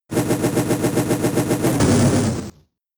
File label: Flame Wheel; attack moves; Pokemon; Gen 4; DPPL HG SS